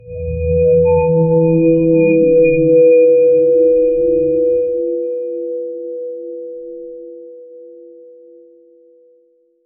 tone shifts slower deeper

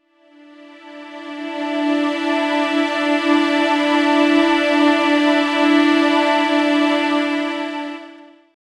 strings layer gets a bit

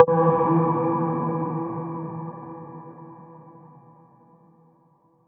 bonk sound

